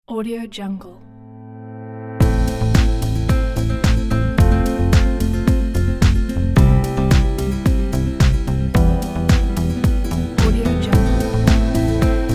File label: night inspirational chill